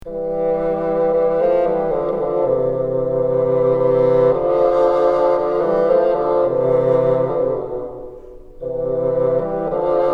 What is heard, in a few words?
together, bassoon; New Note